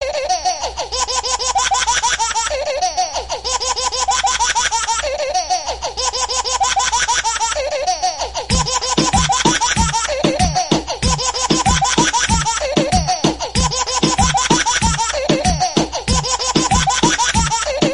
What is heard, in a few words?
Cute Baby